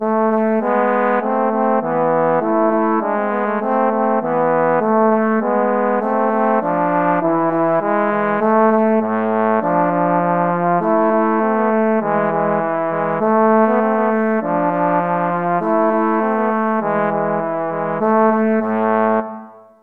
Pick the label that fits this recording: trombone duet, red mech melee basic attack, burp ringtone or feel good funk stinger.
trombone duet